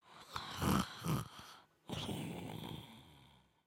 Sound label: Sleep, creatures organic; Lego; Strombie